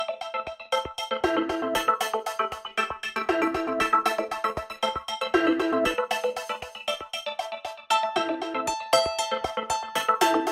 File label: Motorola Official Ringtone; Onward